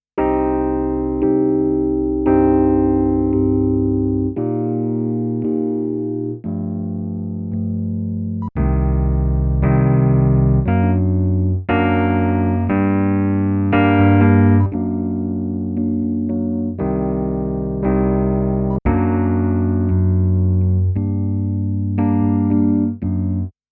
E Piano A B; Golden Age EQ-81 MkIII

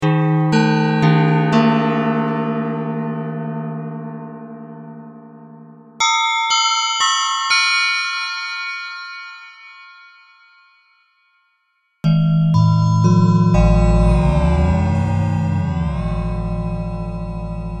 bell sounds

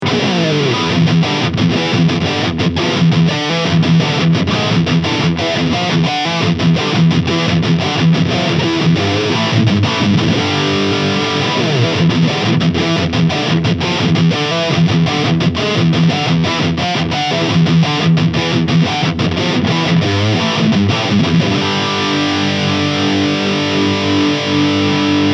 Orange TH100 High Gain

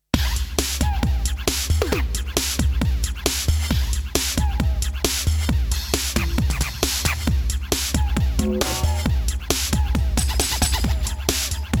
Emax Lofi Drums

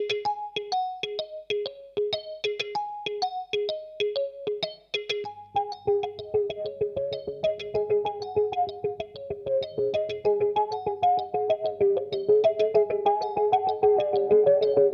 Volca FM

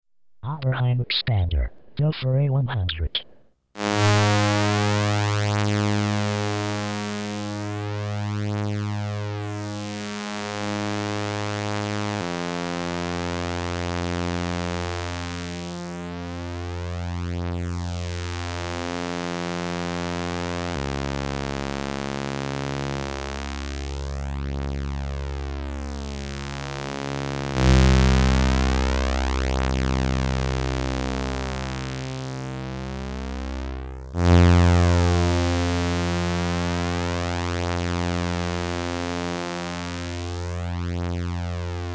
A106 2 Pole Notch

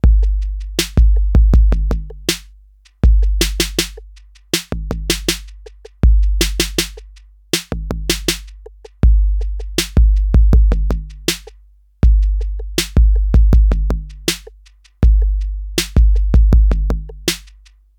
TR808; Oversampled Pancz; Scratchoid Original

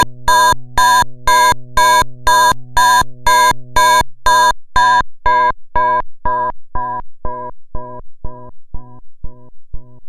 Shruti

